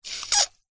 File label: hospital syringe squirt out liquid full chamber squirt